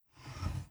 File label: returned state open